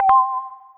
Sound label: notifications; younow